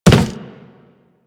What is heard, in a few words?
Walk Wood, Footsteps; Warframe; Operator